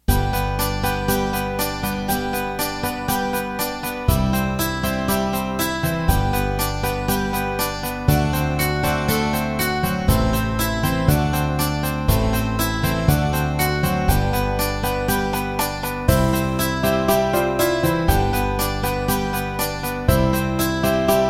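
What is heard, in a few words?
Schlager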